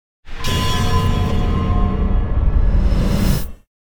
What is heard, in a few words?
Impact Metallic Ring Whoosh Stinger Ring Sharp Metal Ping; Hollywood Edge; sound designer's toolkit v2